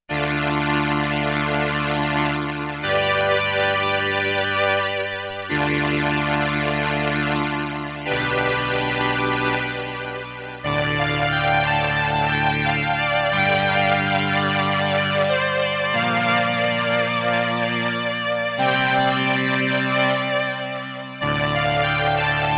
BRASS with vibrato, sustain, and Ensemble I, Roland RS-202